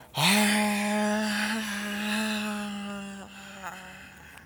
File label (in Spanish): Grito